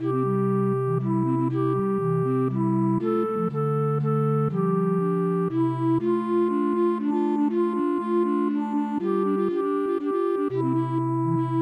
Recorder Quartet; midi; traditional

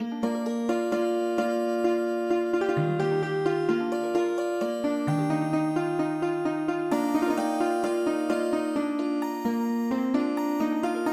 turkish early morning Healing Harp

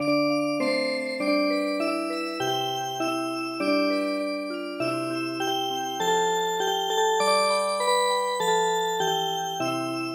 Music Box

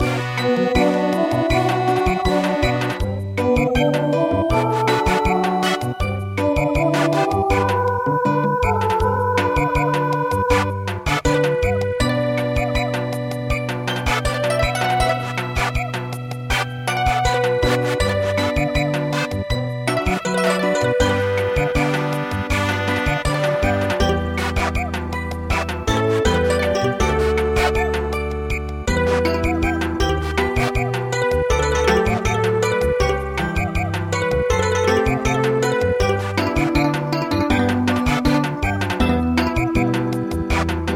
Pastoral Song Samba

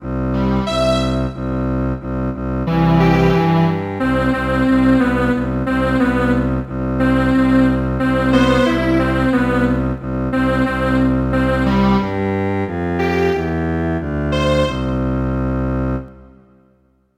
Strings Quartet

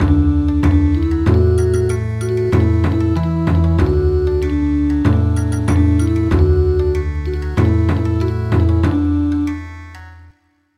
Bon Odori, midi traditional

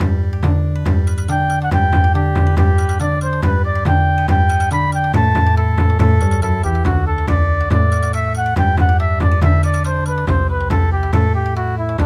Bon Odori

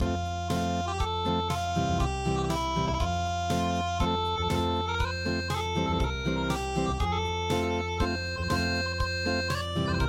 unpluged

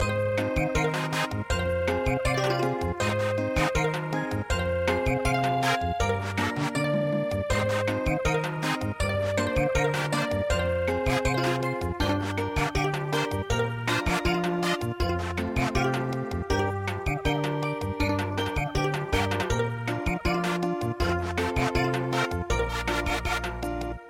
Samba, midi traditional

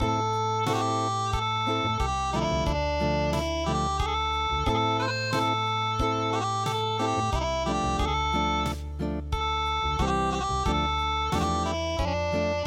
Unplugged

